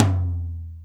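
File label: Lo Dynmic, Alesis D4 drum machine tom; 300 Drum Machines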